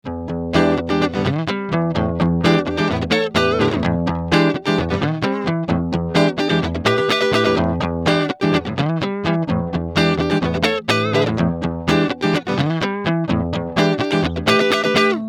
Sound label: blues rhythm